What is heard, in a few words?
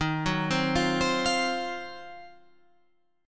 Eb Minor 6th Add 9th, Guitar Open F; arpeggiated; 15,13,12,12,15,12